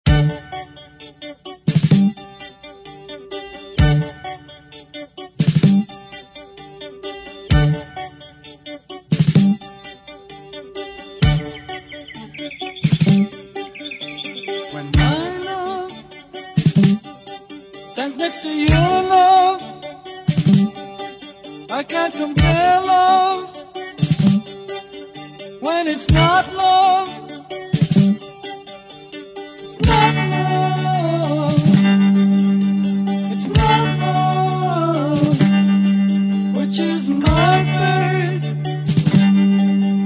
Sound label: 70s Rock; DJDOLLS